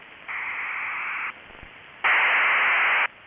S4538 FLSU, STANAG 4538 FLSU, SERIAL TONE 3G ALE SYSTEM